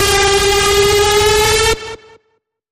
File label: Bend, G; Feel The Bend